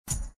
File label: card defence empty; mod card battle